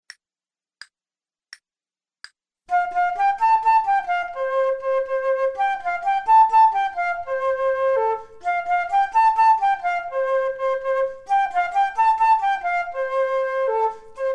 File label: Duets flute; Selected Duets for Flute Podcast